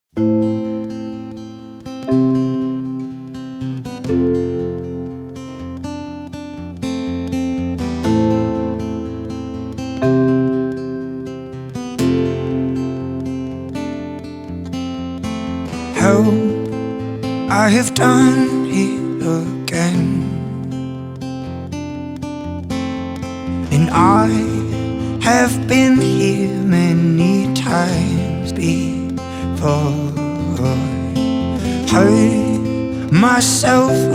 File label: acoustic